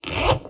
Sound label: zipper open